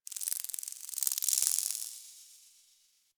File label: Cephalon Tech Very Small, Ambience